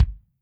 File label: KICK, Drums Percussion; Kick 1